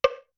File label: Woodblock C4; open-conflict